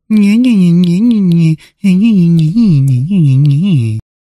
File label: to be ridiculed